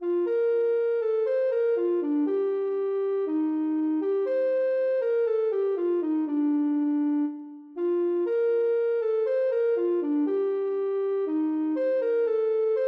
internationale, ocarina

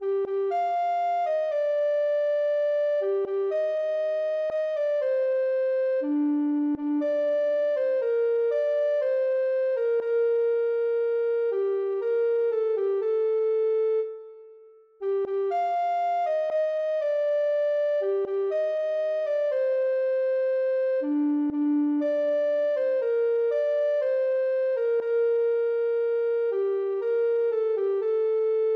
ocarina

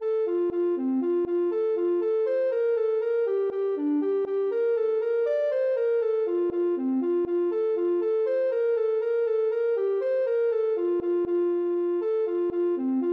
ocarina

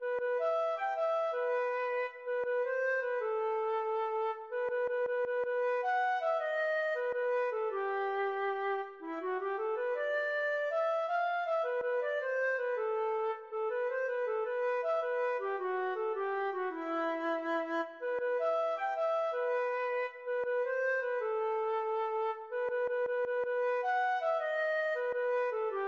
partida, feadóg

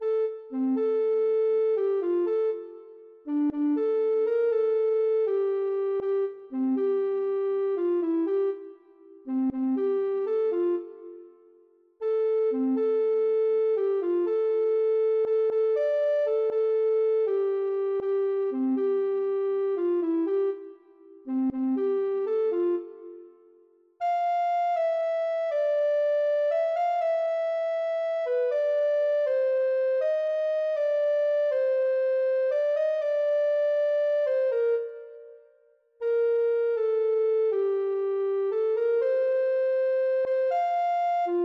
ocarina

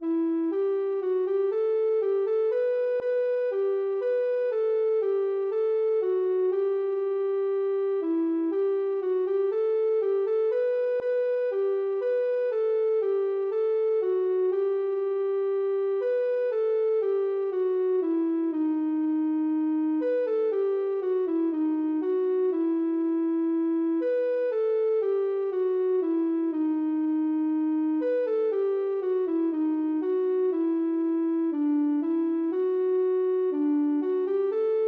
ocarina

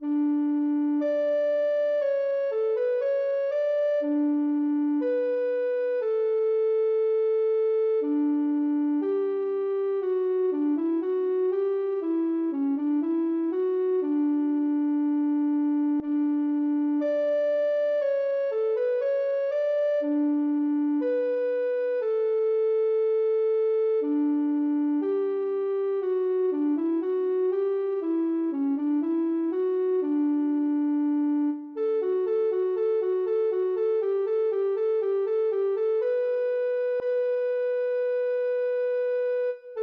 ocarina

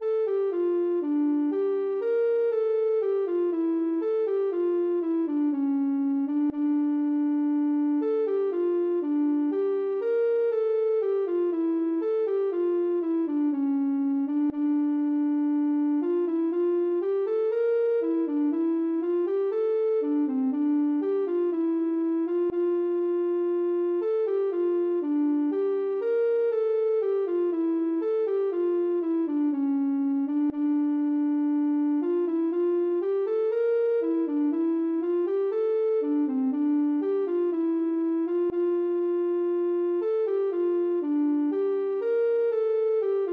bourrée, ocarina; krieger

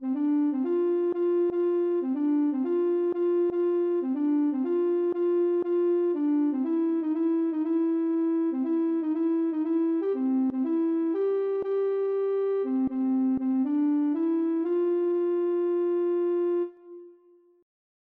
boogie, ocarina

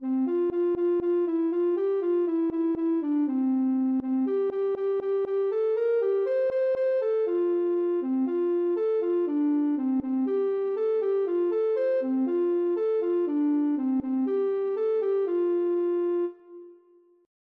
bamaagal, ocarina

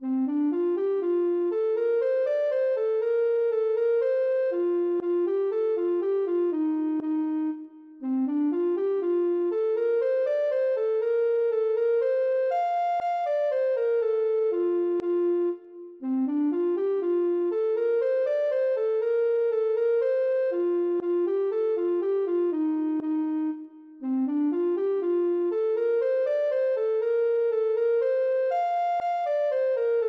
ocarina

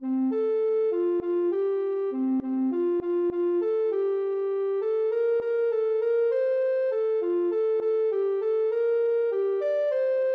ocarina

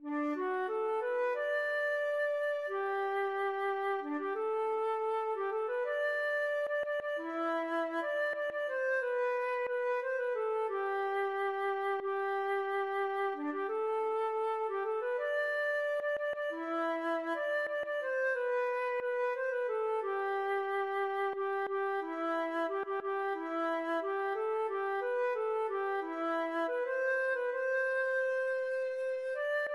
mizvada, feadóg

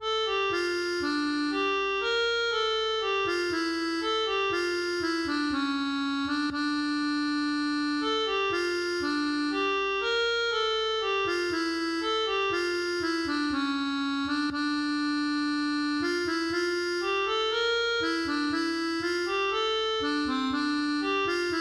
bourrée; krieger

